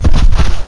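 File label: large, impact explosion; SIN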